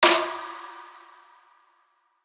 Blocky Snare

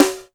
Snare, Alesis DM5

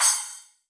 Roland Juno D Brush Kit Crash

